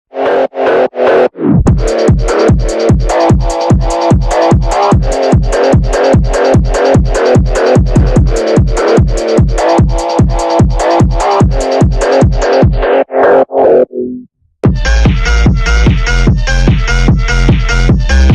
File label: phonk